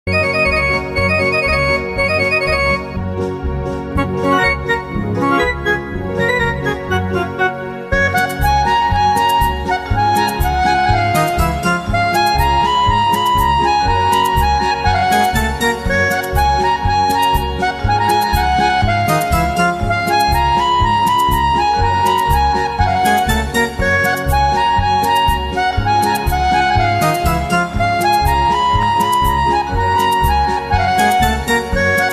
instrumental

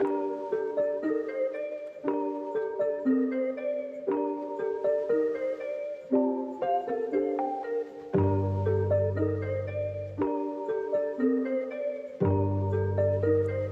interlude

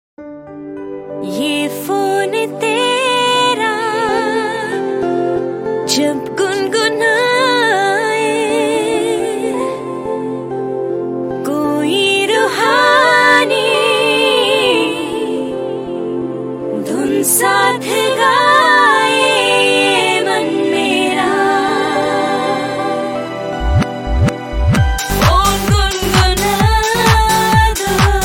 phone gungunao

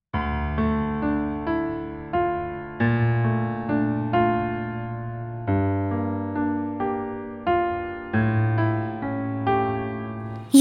acoustic

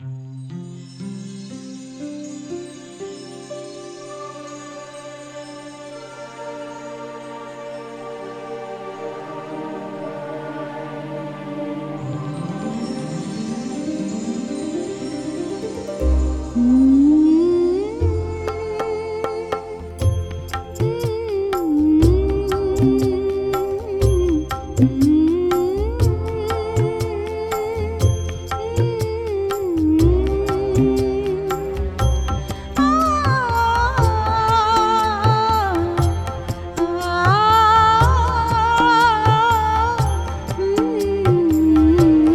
aalap lori

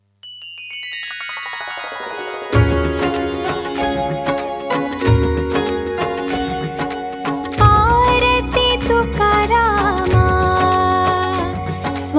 aarti; tukarama

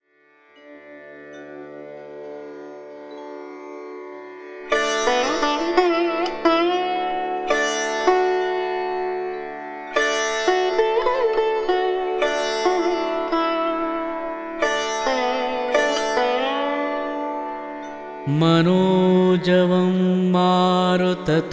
manojavam shlok

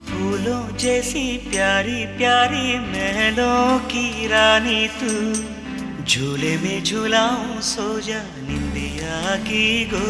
with jhankar beats

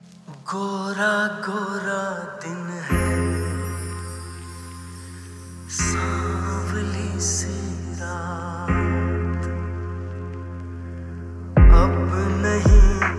lofi

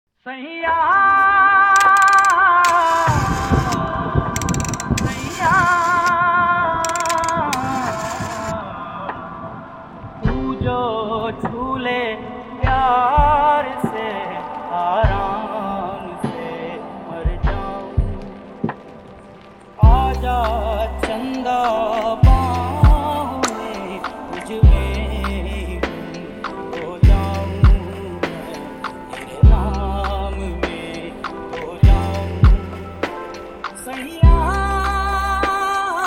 lofi flip

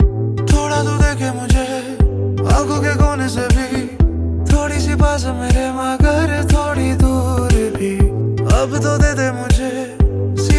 music

